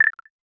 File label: short arcade sfx soft syn; sound effect